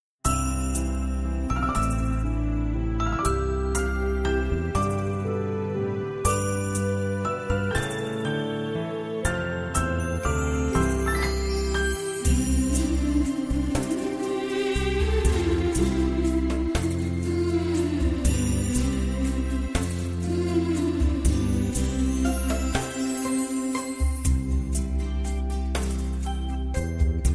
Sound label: X'an Devotional Karaoke